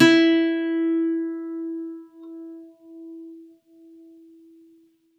E4, Campfire Nylon guitar; Worra's Campfire Nylon

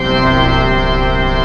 PIPE ORGAN C1; AKAI S-Series CD-ROM Sound Library VOL-8

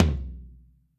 FLR TOM MOD; Q Up Arts; Denny Jaeger Private Collection

